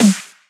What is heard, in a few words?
Heavy Snare